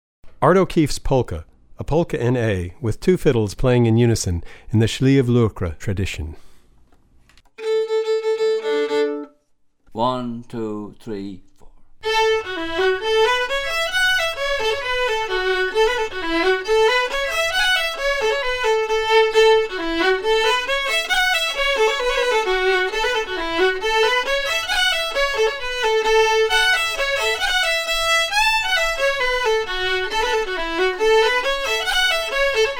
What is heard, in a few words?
Polka